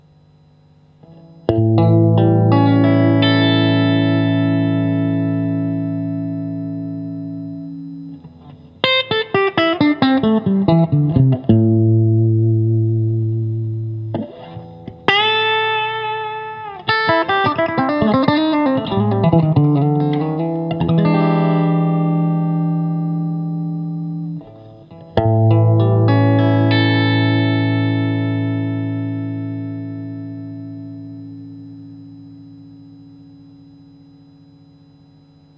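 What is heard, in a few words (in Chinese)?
60S OCC 单晶铜 清音 2档, acoustic guitar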